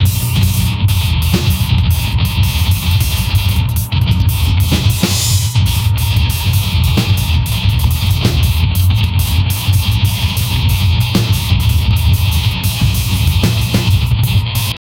bass drum like a black

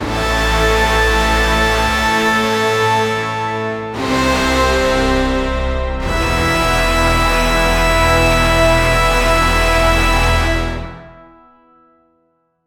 ultra, epic

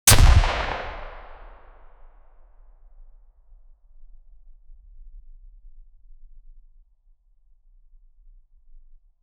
mpx sd 9mm subsonic singl

